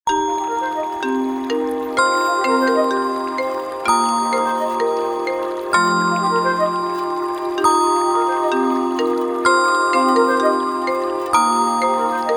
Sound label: opening zen